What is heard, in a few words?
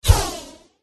defender attack contact bottom